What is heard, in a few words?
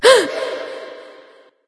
breath light; smite; voicepack; hel